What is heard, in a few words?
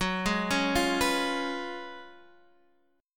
Gb Major 7th Suspended 2nd Suspended 4th, Guitar Half Step Down